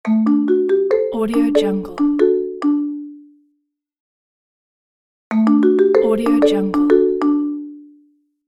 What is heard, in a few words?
smartphone ringtone